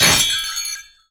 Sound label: Christmas Bell Destroyed; fowl; Christmas Bell Blocks